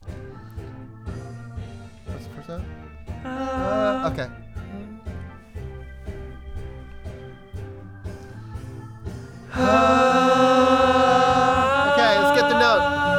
VOX Double

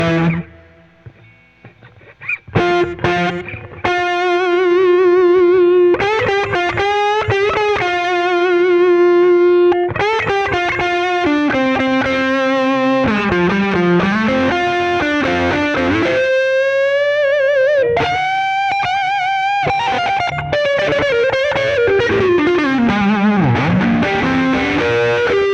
Solo Gtr

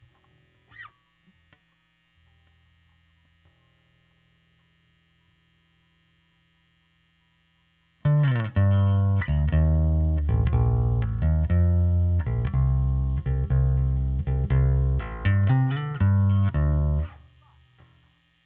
Bass OD

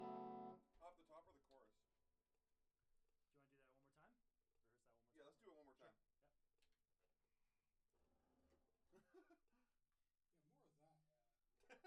Hat

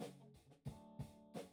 Bass Amp

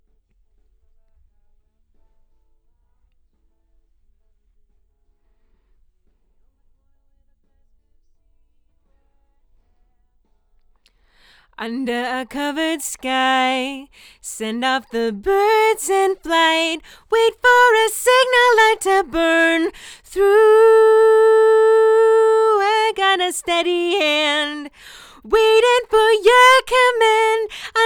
Ld VOX Double

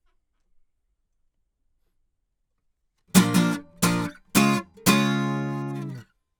Act Guit Comp R

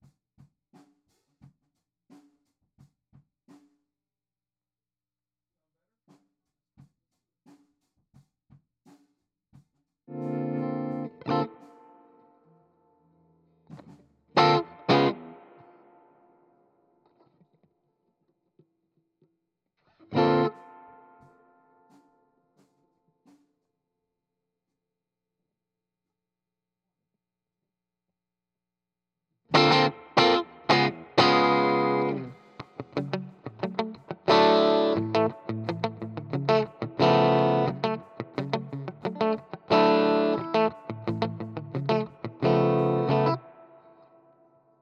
GTR

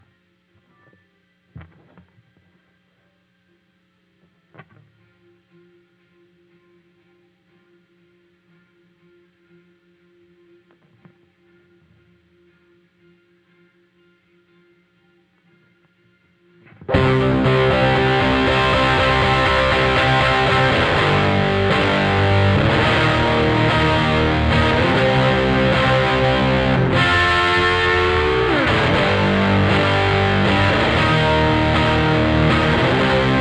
Guitar

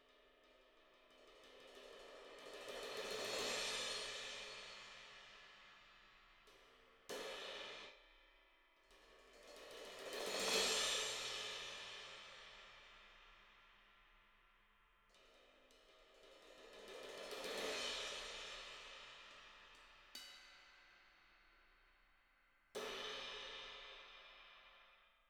Cymbal

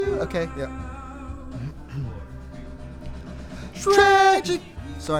VOX Back

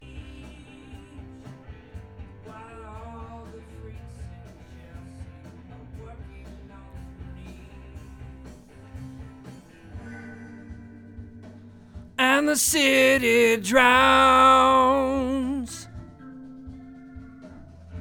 VOX

